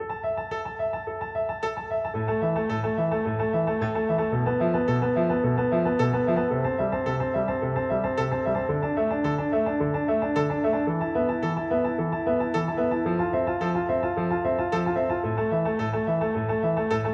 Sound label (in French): sonate